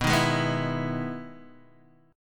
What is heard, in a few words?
E Major 7th B, chord